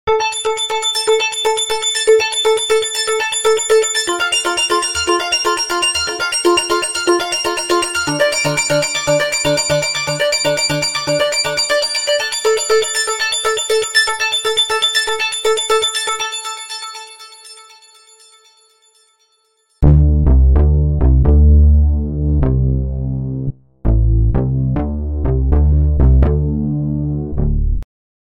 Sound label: Synthmaster Complete